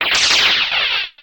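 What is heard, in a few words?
Electric Impact Crack, lasers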